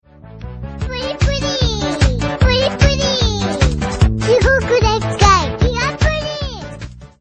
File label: Txt Msg; Puddi Puddi